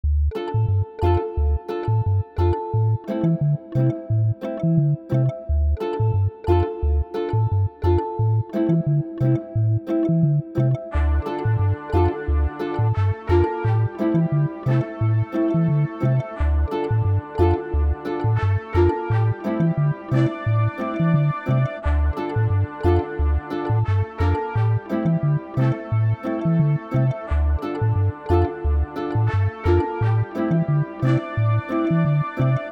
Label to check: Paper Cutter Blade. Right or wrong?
Wrong